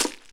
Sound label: Hit Ice